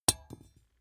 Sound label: small metal drop clang hard; industrie wirtschaft; metall